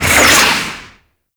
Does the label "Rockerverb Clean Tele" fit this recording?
No